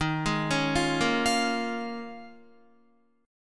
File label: D sharp 7th Suspended 2nd Suspended 4th D sharp, Guitar; D#7sus2sus4; arpeggiated